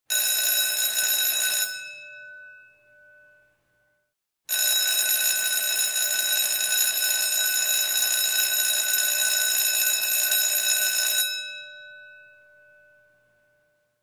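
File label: school bell sound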